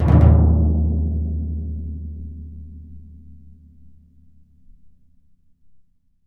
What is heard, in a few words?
TMP, Synclavier Percussion